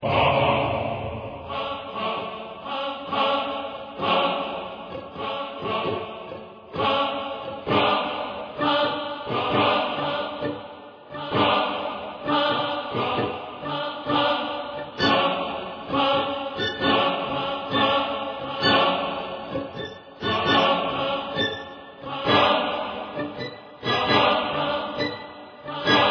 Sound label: Dark Choir and Strings